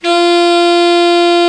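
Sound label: alto, sax; Best Service Gigapack 1; BR SAX 1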